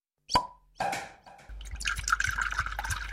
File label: Beer Bottle Open